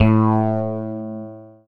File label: STRANGE BASS; Masterbits; Dance Trax